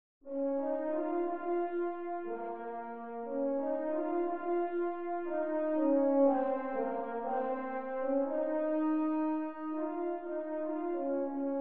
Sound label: Horn Track